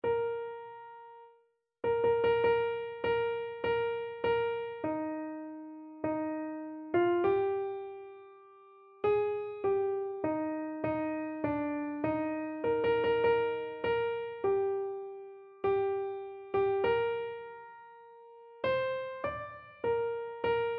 god of the ages, keyboard

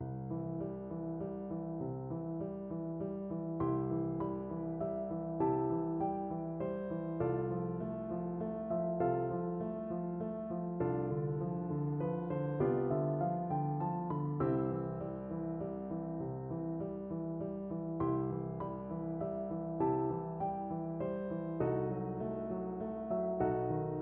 swan piano duet; saint saens

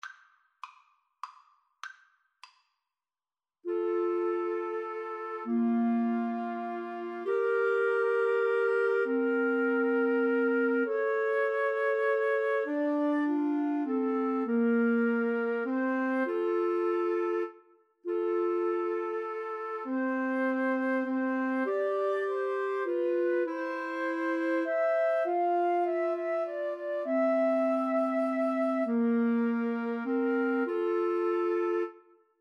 we wish you a merry christmas, 2-Flutes-Clarinet

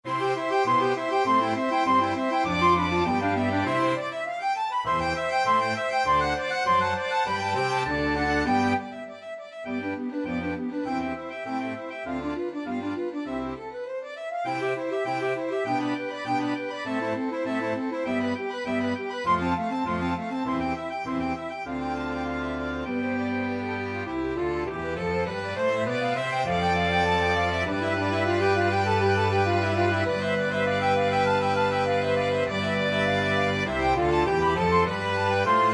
flexible mixed ensemble, 4 players

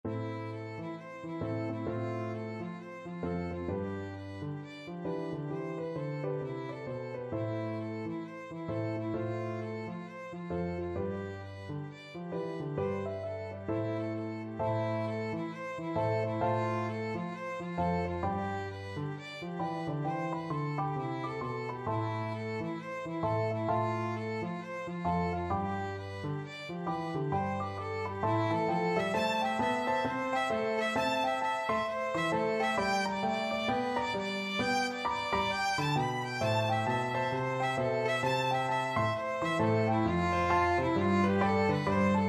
tam lin, violin

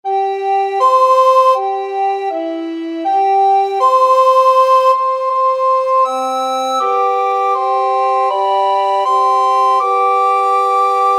australia, recorder quartet

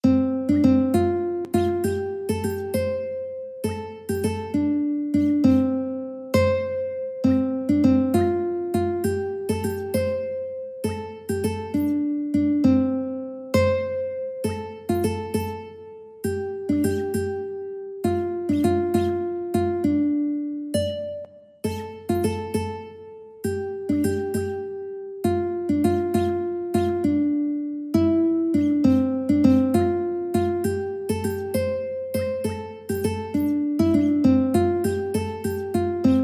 ukulele

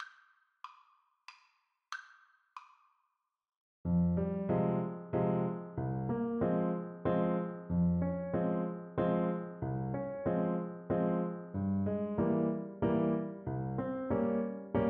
horn, French Horn, waltz

